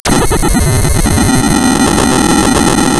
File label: pop, tearing paper